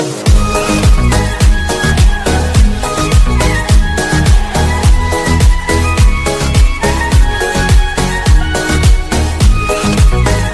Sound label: Arabic ringtone